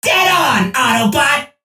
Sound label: Rumble Hit Confirmation; World Of Warships; Entertainment